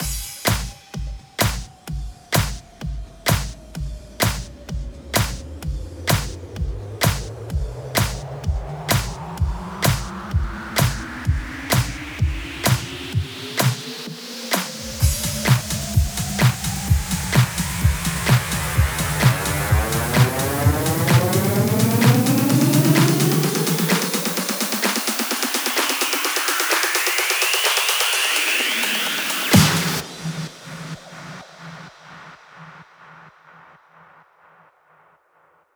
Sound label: 128BPM Filler; 16Bars; Faith; Vengeance Samples; House Electro Fills